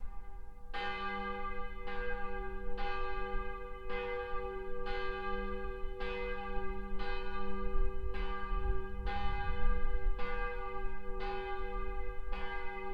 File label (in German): gloria und paulusglocke